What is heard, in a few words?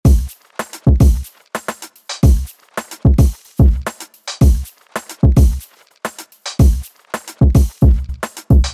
Drum